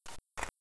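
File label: saw hand movement, weapons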